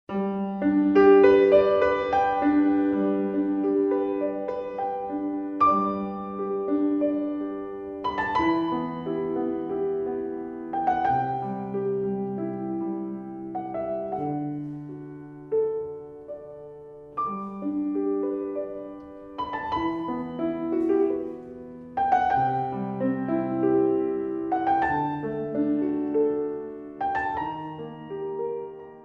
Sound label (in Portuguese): Improviso